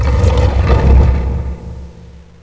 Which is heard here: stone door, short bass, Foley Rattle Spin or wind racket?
stone door